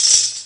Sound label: tambour; Amiga Soundtracker Sample Packs